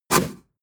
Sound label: push